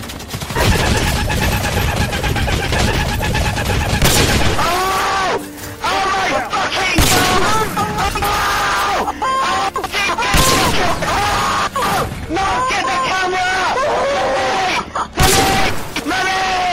quickscope; lmg

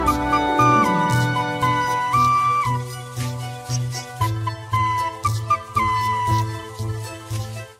flute; mtga bloomburrow kekflute

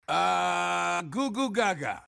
goo goo ga ga; boss baby

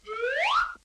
falling asleep

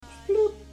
shploop

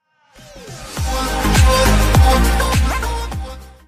twitch alert sounds donation follower sub sounds; hitbox

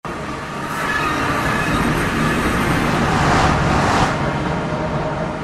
minecraft portal sound

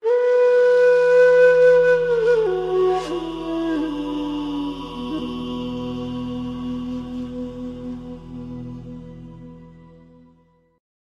mysterious flute

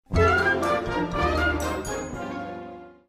cutaway music; family guy

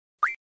cursor move; final fantasy viii sound effects

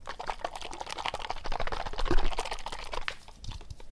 shake

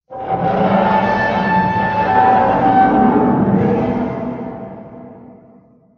high metallic screetch; wendigo

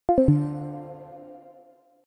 discord winter disconnect sound

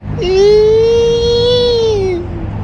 iiiiiiiiiiiiiiiiiii

